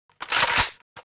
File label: shotgun reload; KNU SpaceShooter